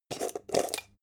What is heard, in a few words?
jar plastic midsize open short